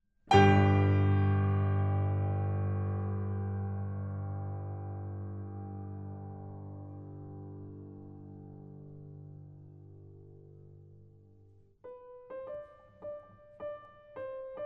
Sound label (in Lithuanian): Schubert Ekspromptas Nr. 1